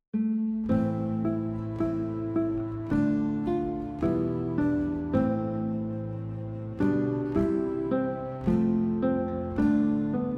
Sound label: guitar; O Come O Come Emmanuel; christmas songs